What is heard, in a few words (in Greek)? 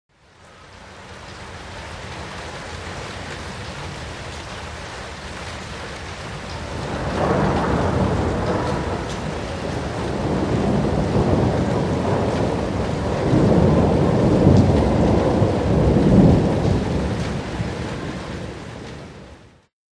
Απαλή βροχή που εξελίσσεται σε καταιγίδα